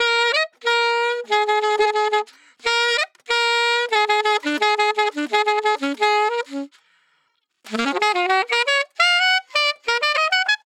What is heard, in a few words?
sax warm blend dry; blush